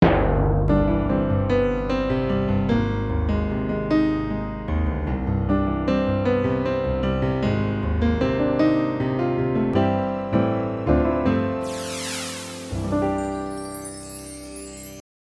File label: Jingle; Rowhouse Cinema